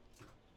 Coast, Train; OpenBVE

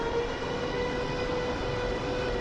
starter loop, Train cl158; OpenBVE

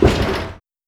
Motor, train; OpenBVE

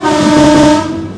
klaxon, Train; Cl323; OpenBVE

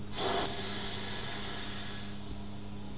Air, Train; OpenBVE; CC6560 tee

